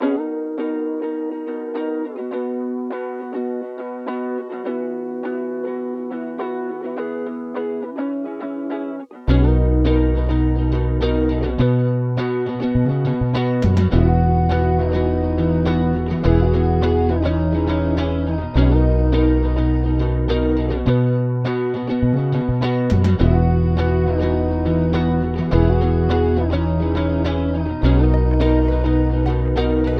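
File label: 207bpm B major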